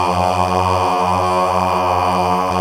GOSP. AAAHH; Sound & Vision - Gigapack II CD 1

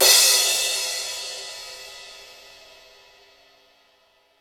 STEREO DRUMS

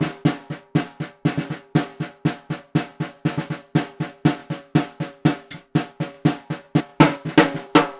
Vintage Ludwig Snare, Percussion

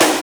SNR BEND, snares; Sn Modules 5; Roland - Rhythm Section

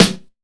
SD, Ragga & Dub Reggae; USB Soundscan vol.27; AKAI

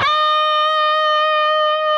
PRS E, Guitars, LEAD; Spectrasonics; Hans Zimmer Guitars VOL-1

